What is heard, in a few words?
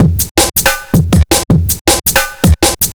Squelch Beat, Drum N Bass; Sonic Foundry (Sony Creative Software); Knutrix Drum & Bass